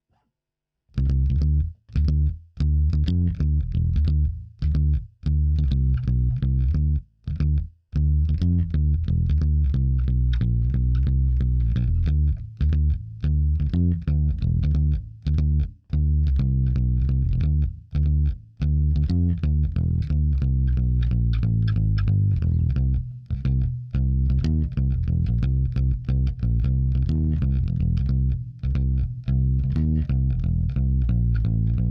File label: Funky Rave